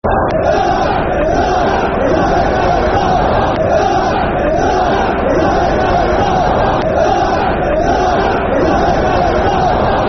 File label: Attack Football Chant; Newcastle United Nufc